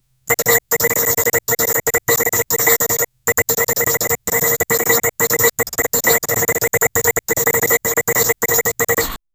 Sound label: typing in app in cellphon; mobile phone